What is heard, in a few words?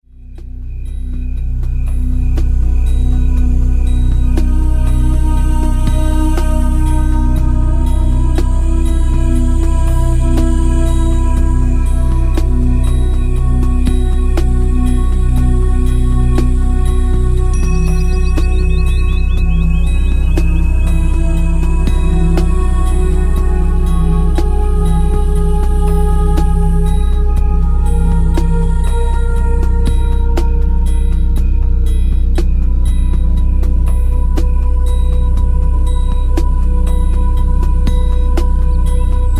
body field sound healing